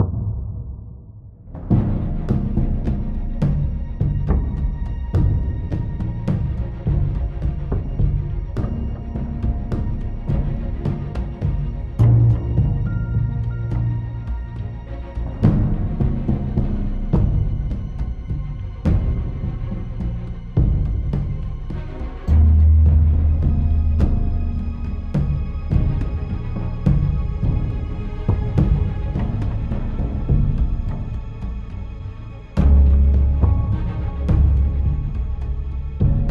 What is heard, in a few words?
Loop Tense